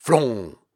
Grunts and Chants, Viking Vocal

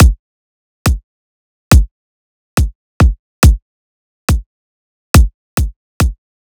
Riddim Drum Loop 140 Kick; Ghosthack; Riddim Essentials Volume 2; Full Drum Loops

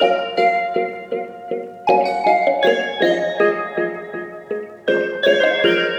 Nostalgic Melody Loop - 160 BPM A# Min; Cymatics; 2020 Melody Collection

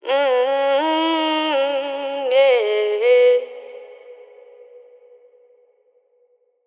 Ad Lib Wet C# Min, Vocals; Cymatics - Eternity Sample Pack; Ad Libs

